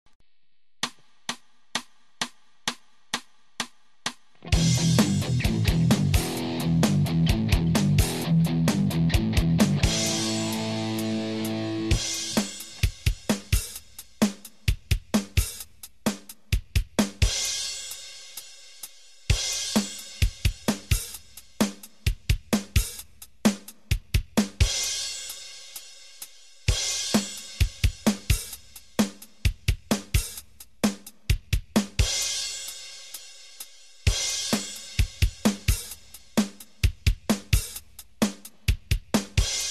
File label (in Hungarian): rock riffek alap, gitár